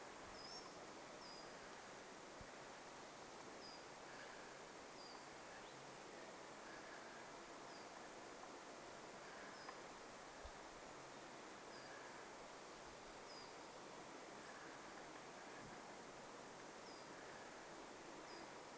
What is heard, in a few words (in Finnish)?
kuusitiainen aantelee